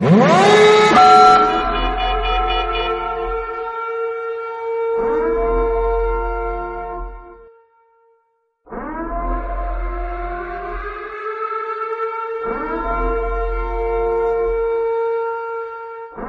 code sound; ss14 corvax delta

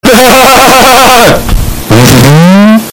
hahahahah hm; ernie; loud

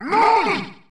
heat dash

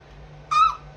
scream

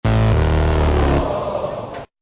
dundun audience incorrect

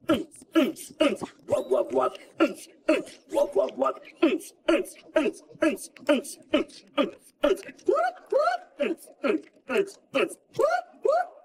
dubstep; claptrap

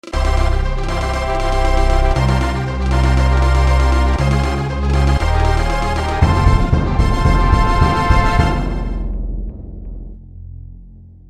fanfare; donkey kong country 2

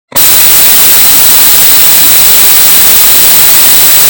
pad

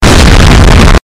exploes

